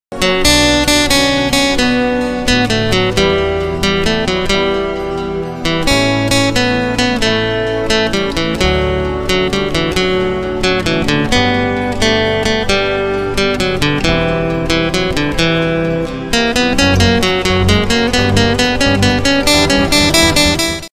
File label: guitar cover instrumental ringtone